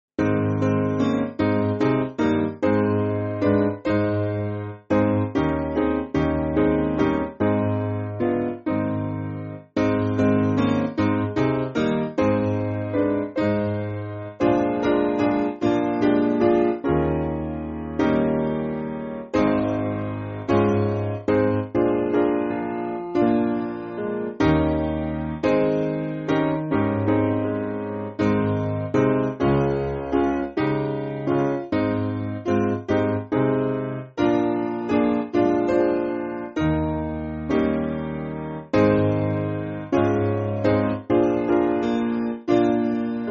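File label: Piano, Music Backing Files for Churches; I Am So Happy; Sewell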